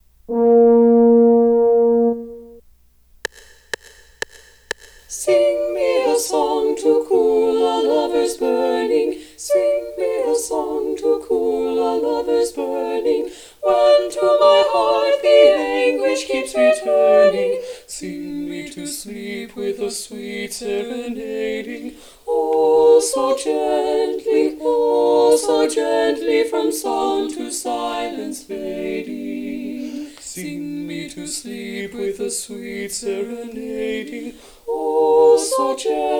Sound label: Bass missing